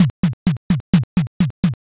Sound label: bon taiko